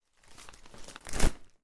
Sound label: newspaper take